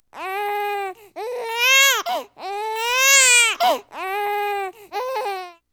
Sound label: BABY CRY; Into The Woods